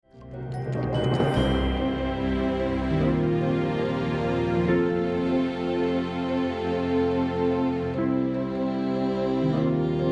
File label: Drone finale